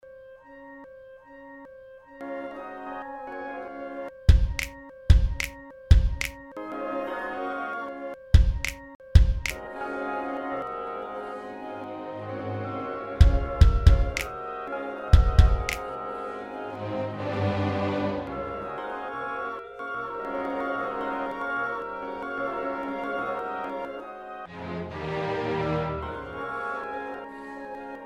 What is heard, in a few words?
instrumental; 2006 glitch instrumentals